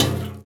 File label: muffler, Impact; Cars; XBOX